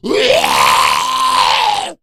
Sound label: Death, zombie; lin gang